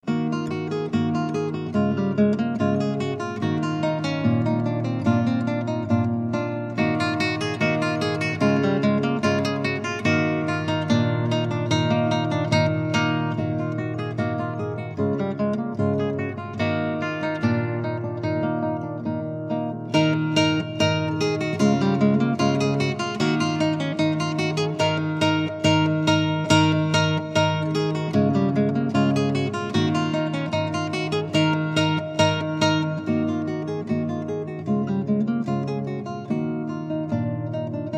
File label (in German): Tanz